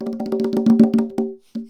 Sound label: Fill, Conga; musicradar